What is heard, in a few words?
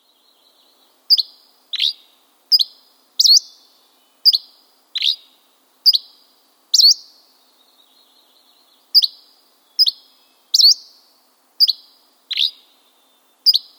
Dusky Flycatcher